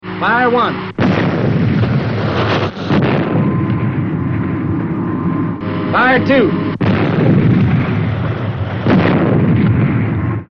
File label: Fire